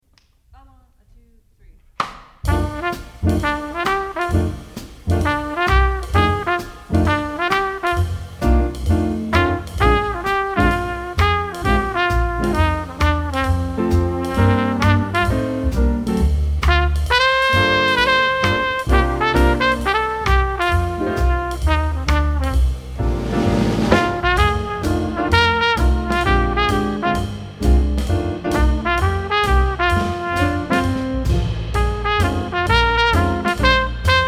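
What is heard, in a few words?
Blues trumpet; All-State Jazz etude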